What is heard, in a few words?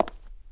cement step; Golden Crayon Sounds